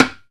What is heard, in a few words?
hi timbale